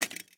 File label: mag, explodium gun